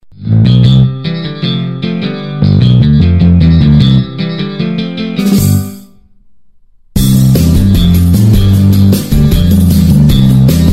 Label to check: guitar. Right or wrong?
Right